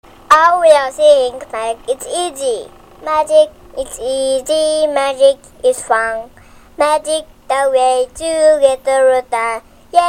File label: singing